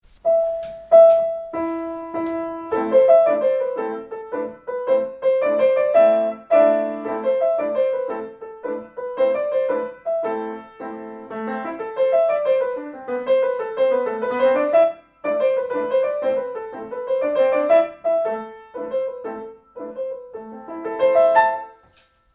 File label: Tarantella